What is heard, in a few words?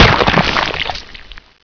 body splat; XDM